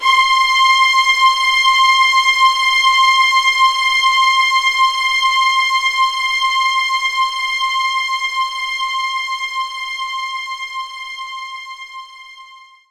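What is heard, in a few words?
String Loop C5; knights try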